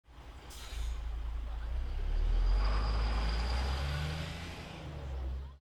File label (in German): bus faehrt los